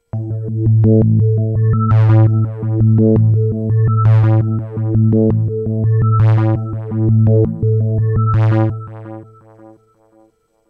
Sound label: sinsine